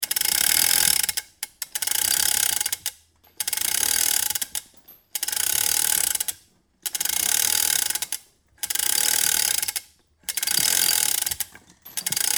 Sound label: Chain Lift Crank, Industry, Chain, Lift, Crank, Up, Manual; Factories; BlastWave